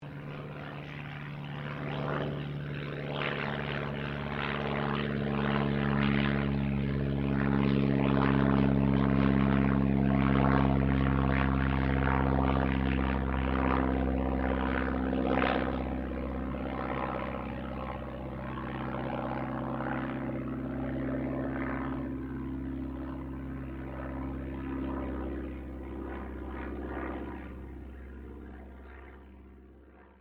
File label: AIRCRAFT DH82A DEHAVILAND TIGERMOTH PASS